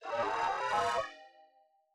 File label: aquarium boss voice Big Warning